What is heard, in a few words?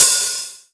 cymbal